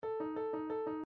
syntax error

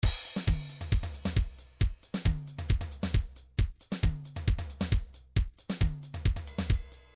trance midi loops beats

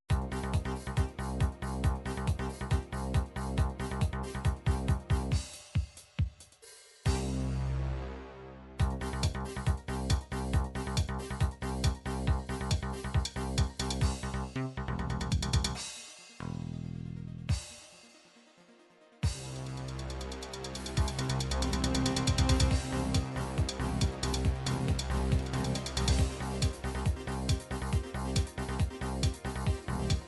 techno, midi

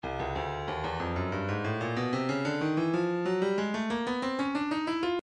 breakbeat midi, 93 bpm; jamma gyro b; Stylus Breakbeat Midi Files

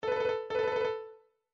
ring ring, midi

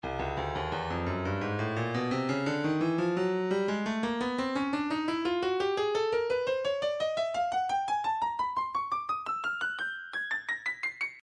breakbeat 086 bpm; Scortched Earth; Stylus Breakbeat Midi Files

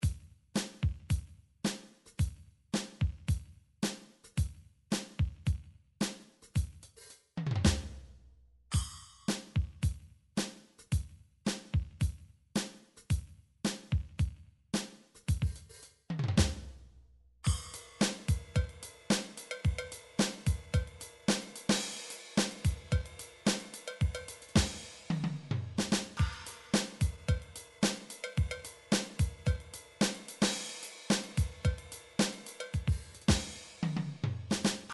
drum midi funk; Drum Midi Files Collection

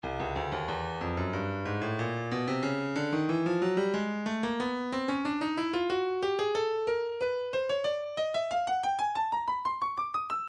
breakbeat midi 092 bpm nustep; nustep c; Stylus Breakbeat Midi Files

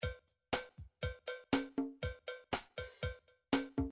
midi drums drums chacha

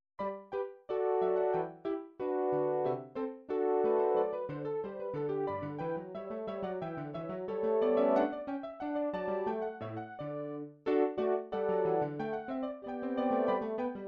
classical gavotte, midi

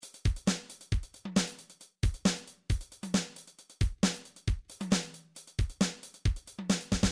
trance midi loops beats offbeat; Newtronic Trance Constuction Kit

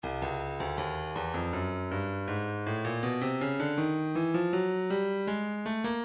breakbeat 080 bpm; Stylus Breakbeat Midi Files; modren b

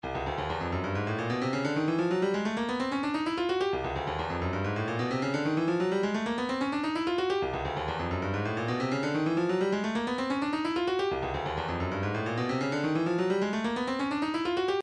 siren slicer, midi

